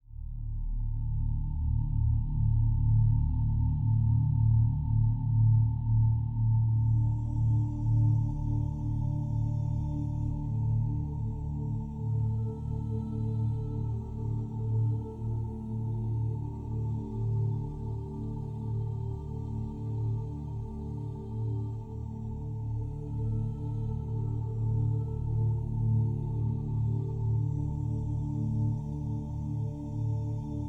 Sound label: Dress Up Ambience; Dark Angels; Windows